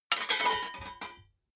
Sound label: metal plate small handling